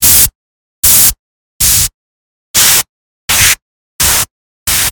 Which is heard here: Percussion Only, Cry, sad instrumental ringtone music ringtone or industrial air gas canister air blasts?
industrial air gas canister air blasts